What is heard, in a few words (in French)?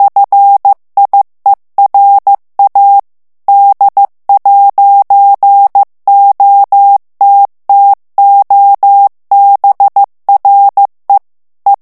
ITALIEN texte clair vitesse 14 mots minute, morse